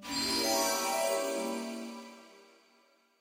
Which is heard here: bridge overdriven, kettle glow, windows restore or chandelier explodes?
kettle glow